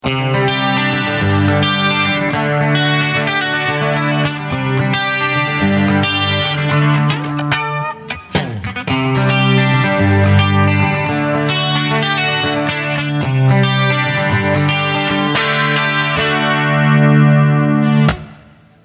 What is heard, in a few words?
Shimy Clean And Compressed